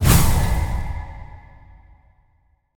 light rune explosive start

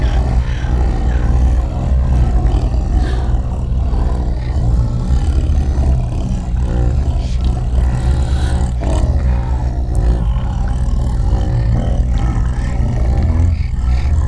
Bacteria Ambience; backrooms 2d android ios online windows gamerip

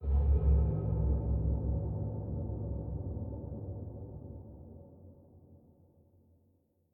Door Open; The Town Intro Sequence; Devour 2021 gamerip pc macos

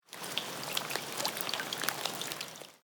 Water Droplets; Idle Champions of the Forgotten Realms

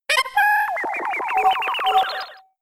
Chatter; attack moves; Pokemon; Gen 5; Blk Wht Blk2 Wht2

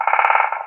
Raptor Idle

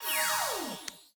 whiteout

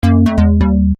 Incorrect; Kahoot; android ios mobile gamerip 2013

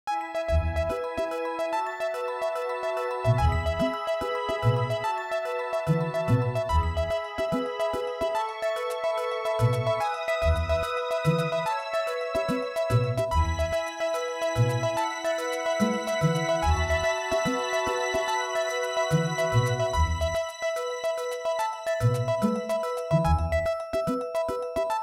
Stage Clear (Medium Speed ~ High Speed); Dr. Luigi

